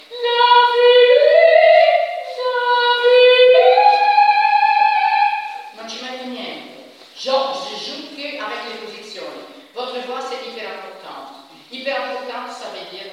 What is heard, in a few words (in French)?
la fumée soprani voix; La Fumée Carmen de Bizet